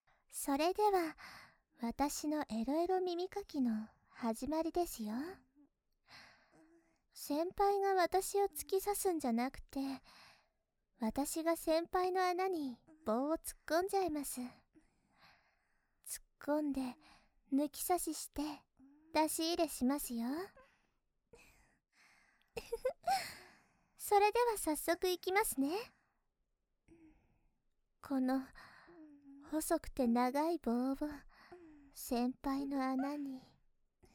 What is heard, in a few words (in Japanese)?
耳かき, あえぐ